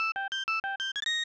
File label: Tile Match Jingle; Shanghai 2 Dragon's Eye; snes